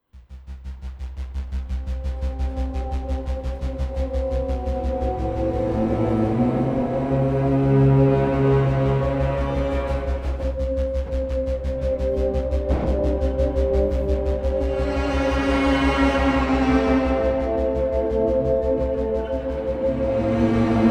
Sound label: intense tv drama film ost; TV Drama / Psychological Thriller